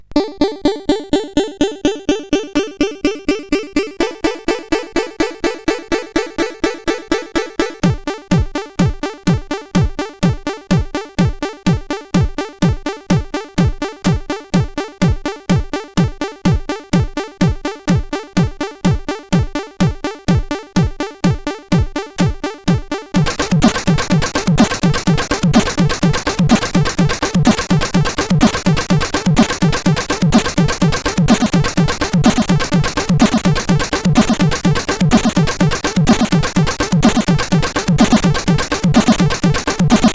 Tekkno Power, CSG8580R5

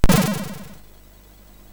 Bomb Chase Revival SFX, sid MOS6581

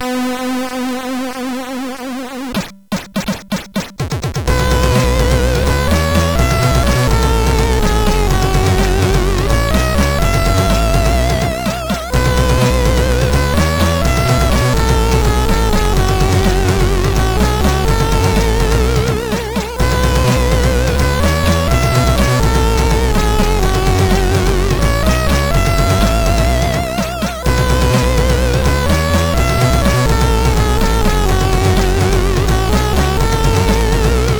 Intro Tune, CSG8580R5

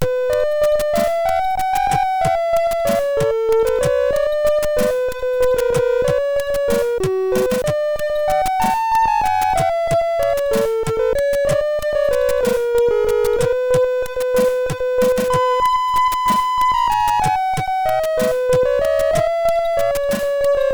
Cover, MOS6581R3; sid; hvsc

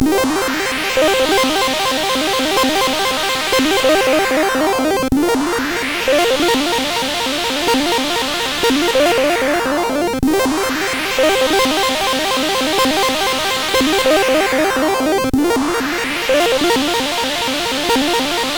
Slow Music, CSG8580R5; hvsc

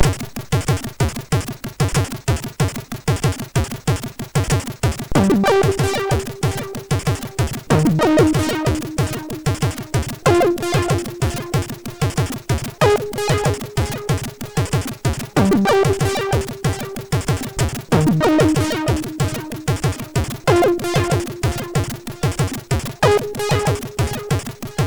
Synthy; MOS6581R4; hvsc

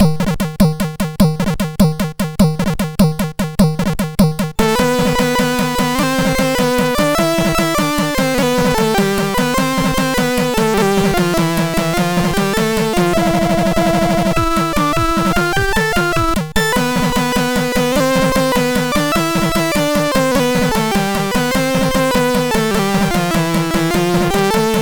Medieval Song, MOS6581R3

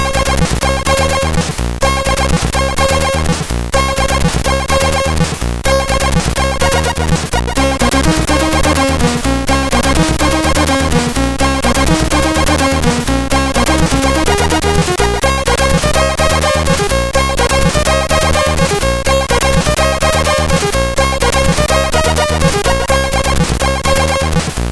Small Song MOS6581R3

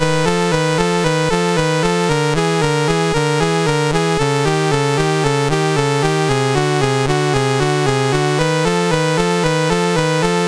Project Firestart, MOS6581R4

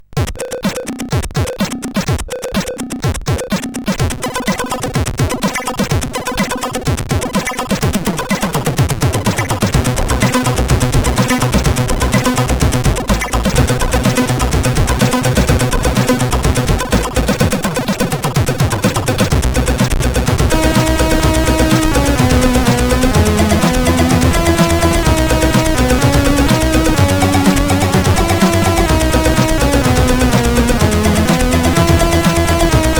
sid CSG8580

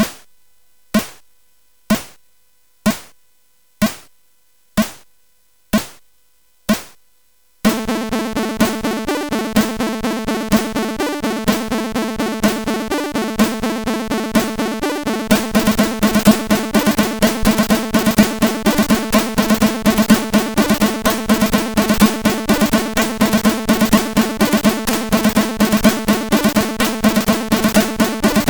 Percussion, CSG8580R5

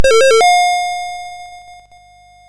Buggy Boy, MOS6581R2

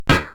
SFX; Narco Police

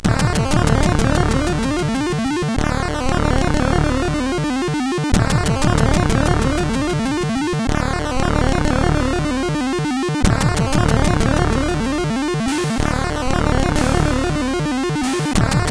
Trance, CSG8580R5; Digger; hvsc

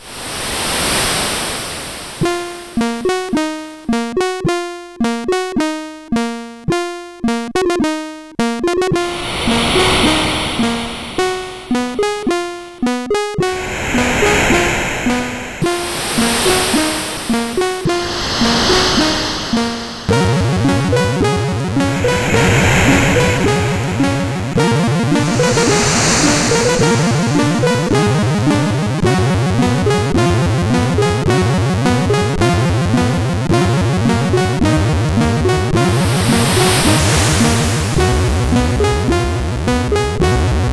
Carrillon, CSG8580R5

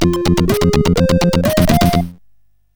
sid CSG8580R5

